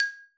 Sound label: Drillbot Step